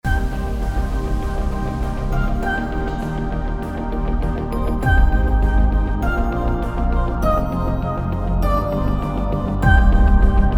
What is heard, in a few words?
Interval BGM Mix; extended version; Tillu Square